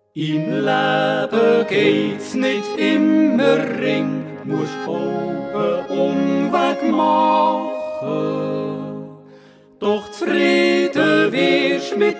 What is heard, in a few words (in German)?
Terzett